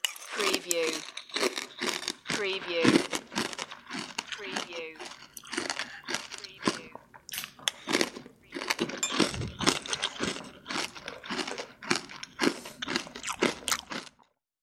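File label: Chowing Cereal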